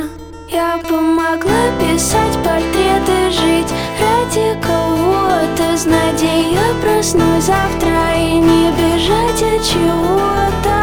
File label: Acoustic